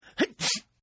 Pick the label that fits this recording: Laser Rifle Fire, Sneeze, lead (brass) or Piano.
Sneeze